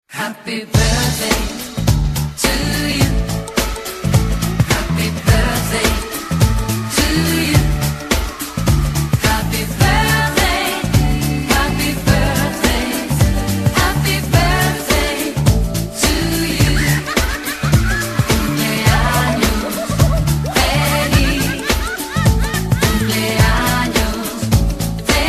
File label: Happy birthday to you